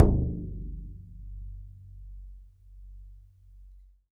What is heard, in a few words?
BODHRAN, Celtic Percussion